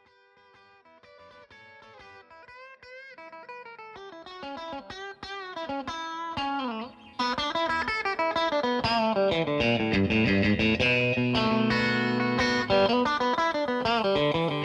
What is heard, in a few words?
wine woman and blues